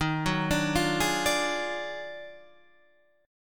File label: Eb Minor Major 11th, Guitar, Standard; EbmM11; arpeggiated; JGuitar